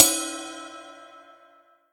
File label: soft hitclap